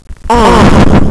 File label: bump; bong